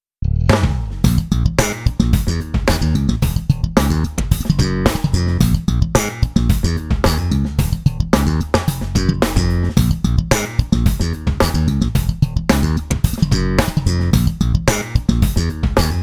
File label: corebass cherry slapped